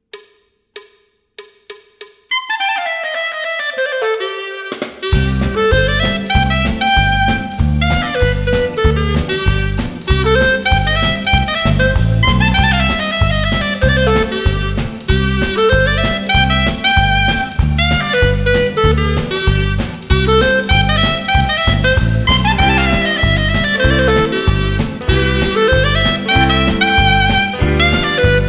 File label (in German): Klarinette